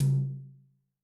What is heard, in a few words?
Tom, Yamaha DTXpress II